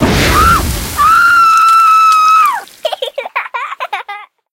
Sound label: Fire plus Giggling; Jungle; Running Man